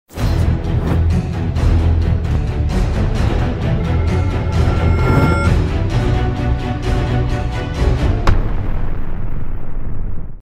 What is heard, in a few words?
Cinematic Music; Conjurer; Town of Salem 2